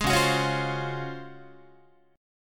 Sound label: Db Major 11th down Guitar; strummed; JGuitar